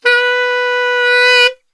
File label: B 2 SAX, Brass Super Section; Best Service